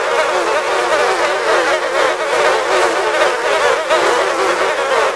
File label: swarm of flies; Max Payne; hotel